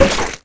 hit

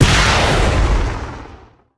rocket fire, weapons

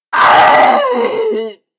death

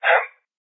jump

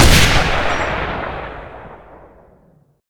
rifle fire, weapons

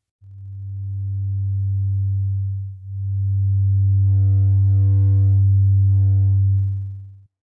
machine

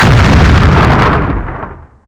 rocket impact, weapons

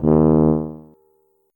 tuba note

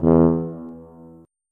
tuba note

